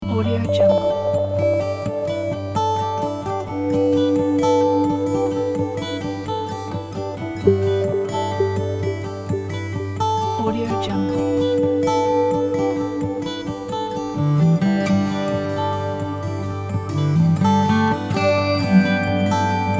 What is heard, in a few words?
ambient background; paulgraves